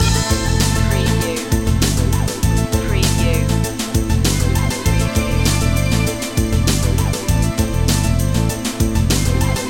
impulse loop

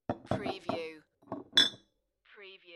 Beer Bottle Clink; beanstalk audio

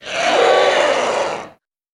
creature vocal

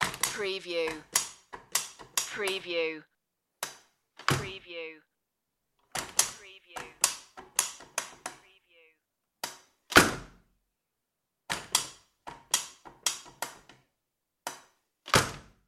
window handling

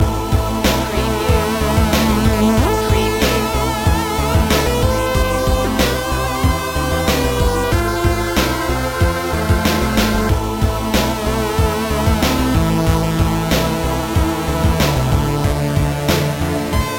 retro video game hotzone loop

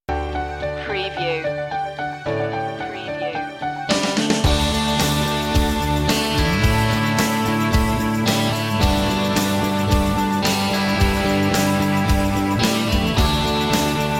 pop celebration

